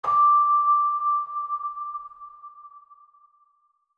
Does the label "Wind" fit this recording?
No